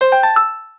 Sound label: Peg Connect; Samsung SGH-i617 BlackJack II